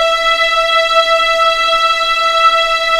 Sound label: E5 EXP MF, VIOLINS; East West; The Ultimate String Collection